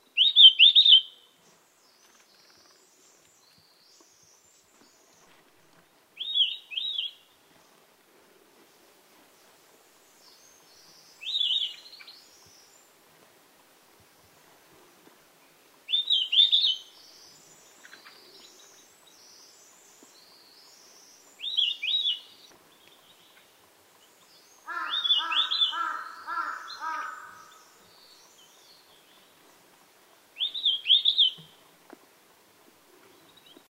白眉林鴝; 鶲科/留鳥

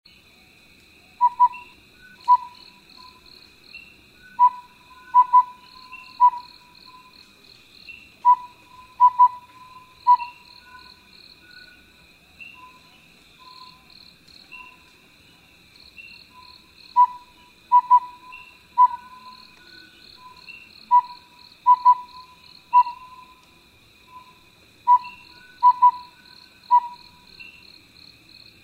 鵂鶹; 領鵂鶹; 鴟鴞科/留鳥

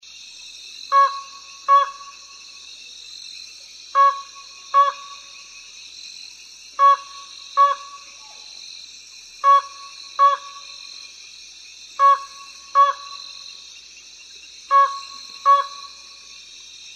灰腳秧雞; 灰腳斑秧雞、白喉斑秧雞; 秧雞科/留鳥

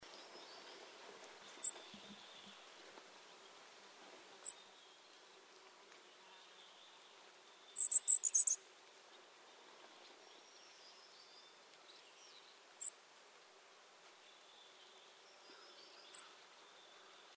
紅尾鶲; 棕尾褐鶲; 鶲科/夏候鳥

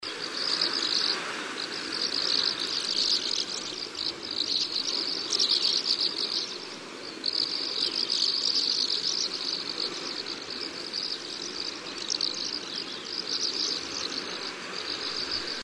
東方毛腳燕飛鳴; 燕科/留鳥

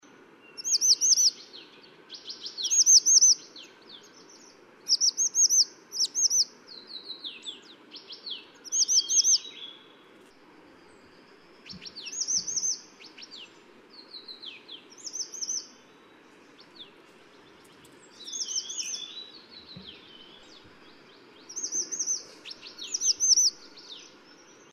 灰喉山椒鳥; 山椒鳥科/留鳥; 紅山椒鳥

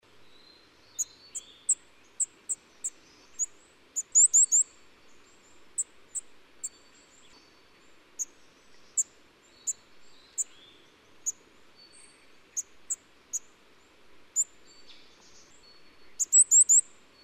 赤腹山雀; 雜色山雀; 山雀科/留鳥

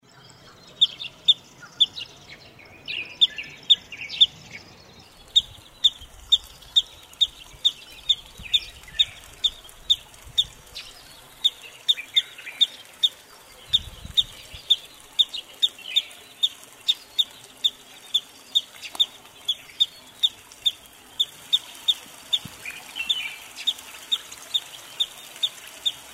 鷹斑鷸; 林鷸; 鷸科/冬候鳥．過境鳥

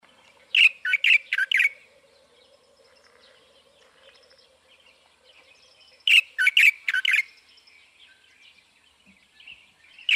白頭翁; 白頭鵯; 鵯科/留鳥

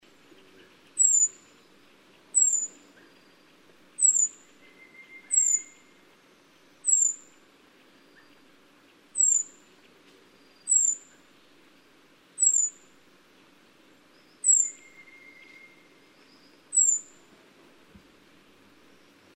黃胸青鶲; 黃胸姬鶲; 鶲科/留鳥

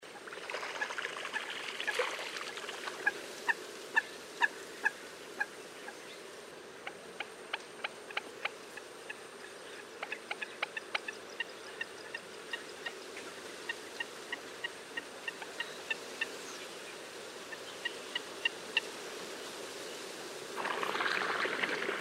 紅冠水雞; 黑水雞; 秧雞科/留鳥

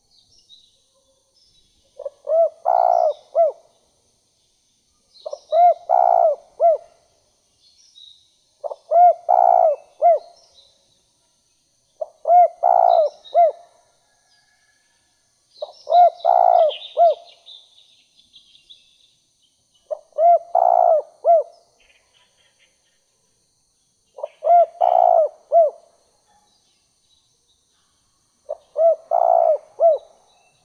珠頸斑鳩; 斑頸鳩; 鳩鴿科/留鳥

